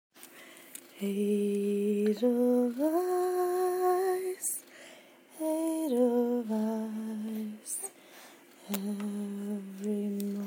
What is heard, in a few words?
singing to sleep